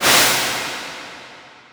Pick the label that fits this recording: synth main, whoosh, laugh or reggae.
whoosh